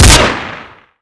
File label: elite 1, weapons; Counter-Strike Pack